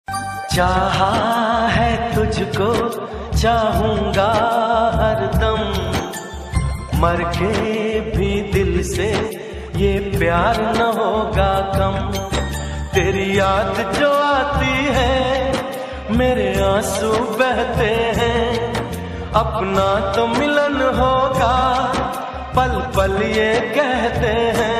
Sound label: Old Bollywood Song Ringtone